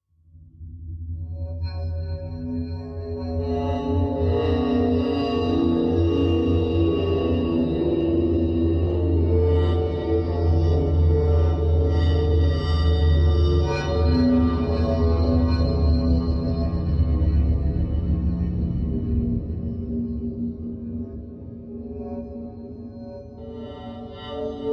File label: Plutonium Mystery, metallic